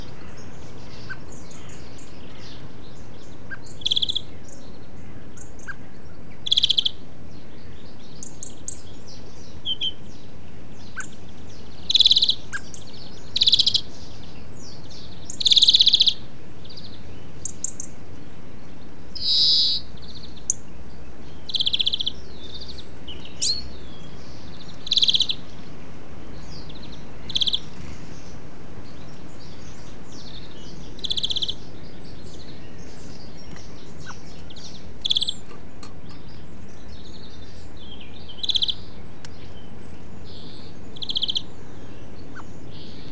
Chloris chloris; SETE

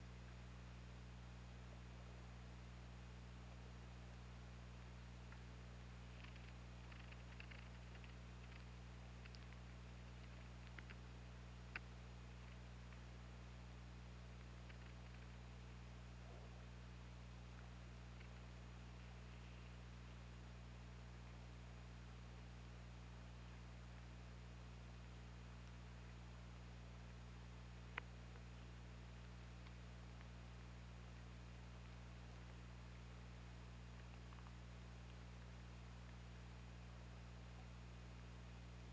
Orca; OrcaLab

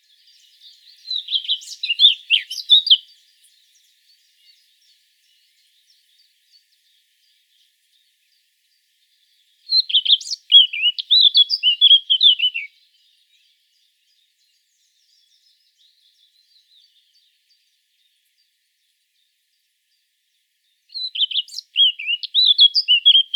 Sylvia atricapilla; SETE

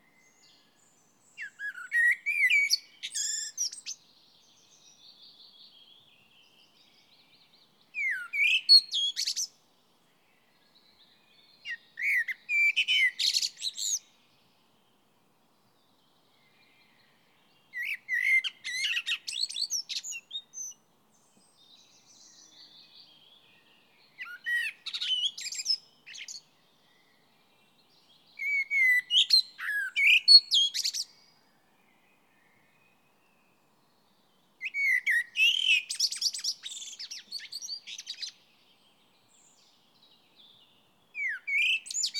Turdus merula; SETE

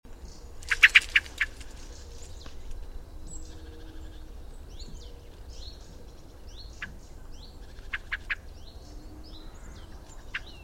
Turdus merula; SETE

